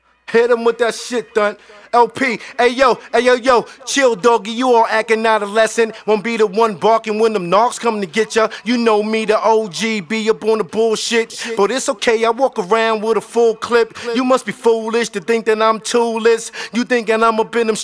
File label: acapella